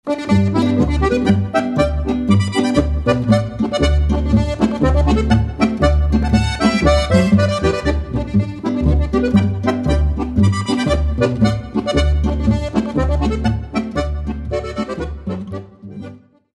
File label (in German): polka; heiligkreuzpolka